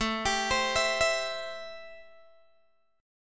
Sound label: A-Minor 7th A Guitar Open G